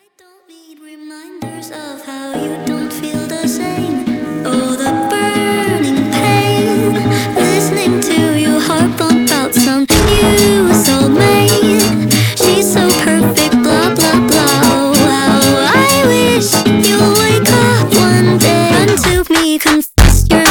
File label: FUNK Slowed